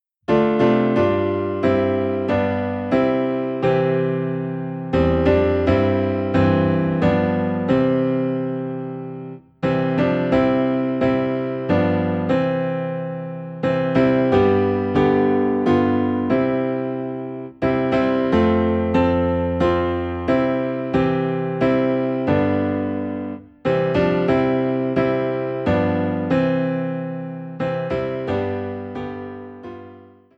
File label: Piano Low in C; Trust and Obey